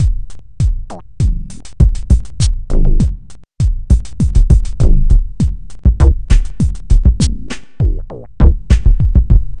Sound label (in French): musique; MOBICLIC 9